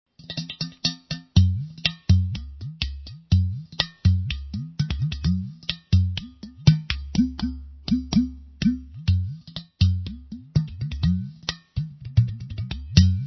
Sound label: udu